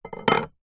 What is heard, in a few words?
metal pole small set down table; foley